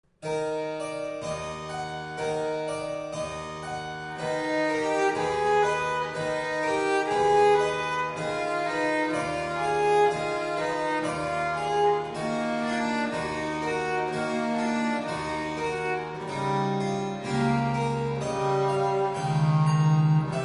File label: allegro; BWV 1027